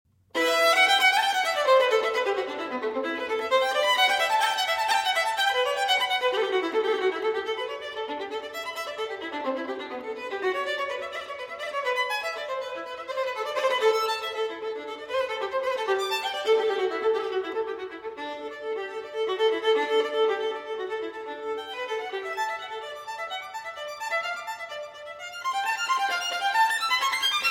Sonatas For Violin Solo, Sonata No. 4 in E Minor - III. Finale; Ysaye; Op. 27